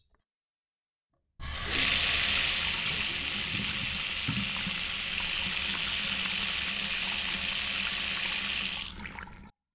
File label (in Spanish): Agua